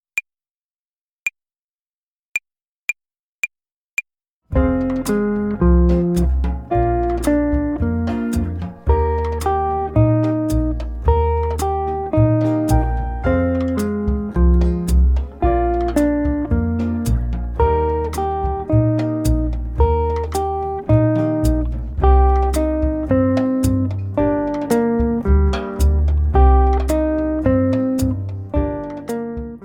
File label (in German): Akkordzerlegungen Übung 2, Play-along Slow, Gitarre; Jazz Club